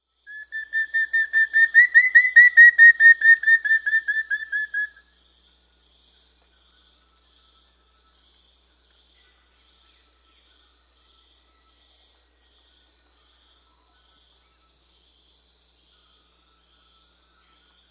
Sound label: Rufous-fronted Antthrush (Formicarius rufifrons), Peru; S. America